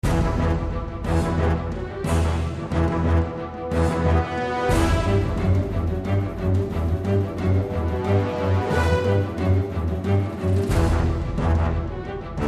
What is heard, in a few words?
Action